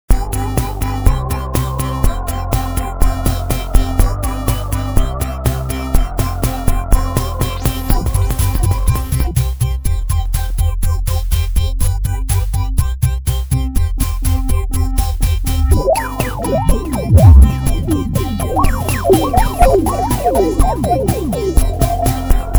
Analog Nu Wave; V-Synth

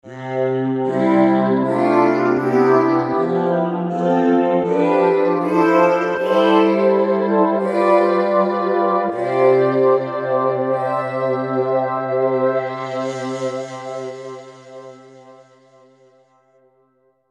Velvety Choir, V-Synth

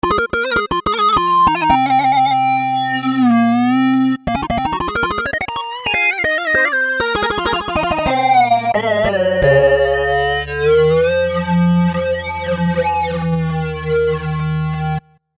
Skewed Metal; V-Synth

